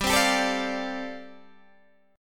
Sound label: G 9th Suspended 4th, Guitar Open D